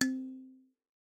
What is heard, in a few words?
kalimba; serengeti kings